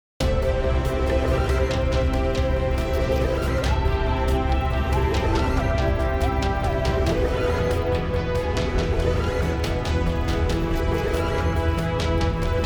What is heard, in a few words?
music; rocket racers